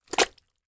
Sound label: Foley Mud Suction Wet